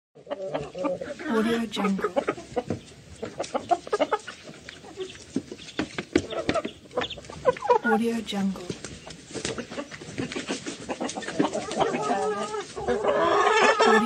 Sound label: chickens eating; nerso-77